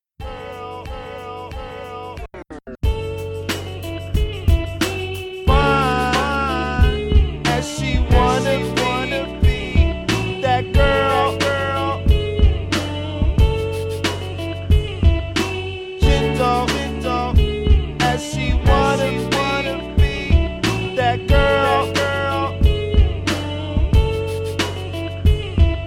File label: remix; sound fx